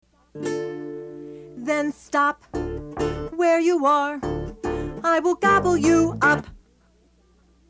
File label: recitative with voices; nanny goat